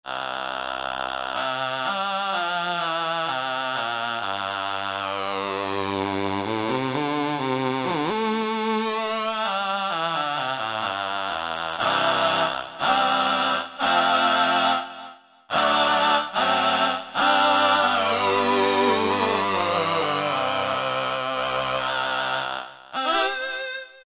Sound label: Voice, Korg microKORG Factory Voices